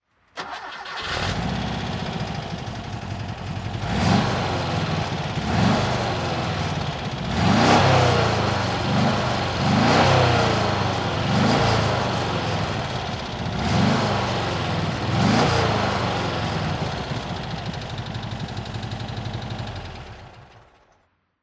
vw porsche 914 throttle

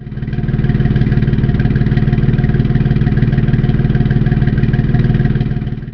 xk150 fhc

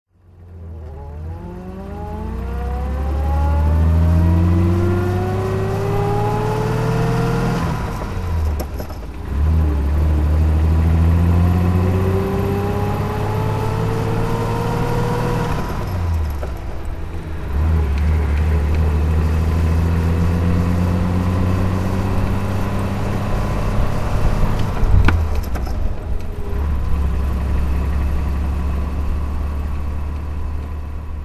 alvis speed25 driving

